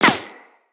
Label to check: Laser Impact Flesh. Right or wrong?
Right